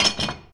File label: CHAIN, Anime Sound FX; COMIC